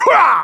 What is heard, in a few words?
kiai; MonsterHunter; Fight